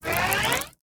Heat Beam Charge

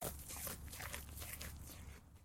eating hay

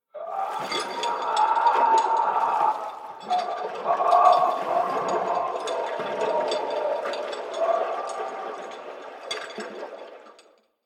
Well; The Town Easter Egg; Devour 2021 gamerip pc macos

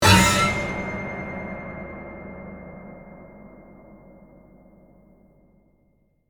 Death Stinger

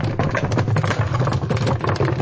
wood rolling; Angry Birds Seasons

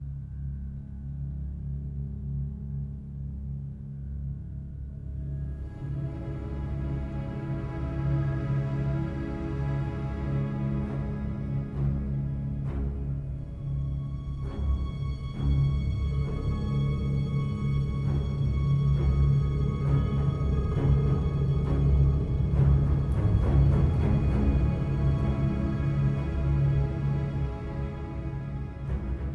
Music Menu

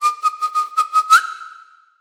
decoy whistle; Aragami

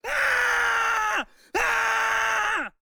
Tortures Male Screaming; This Is the Police 2

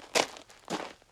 Footsteps Dirt; Idle Champions of the Forgotten Realms

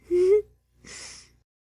Cuddle

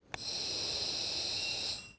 Tortures Gas

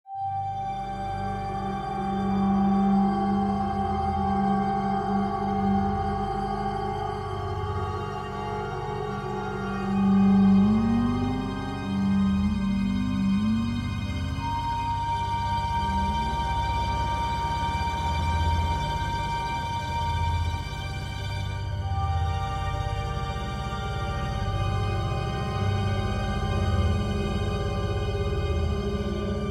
Tense

